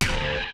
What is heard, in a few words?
hit, Roland MC-909